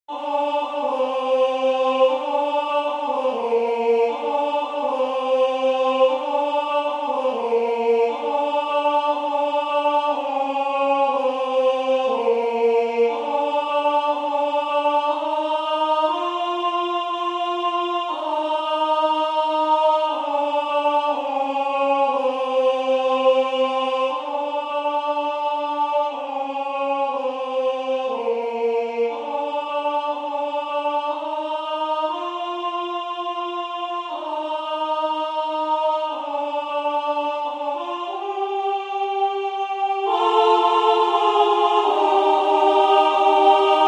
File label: Alto